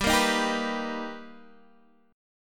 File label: B Augmented Major 7th G, chord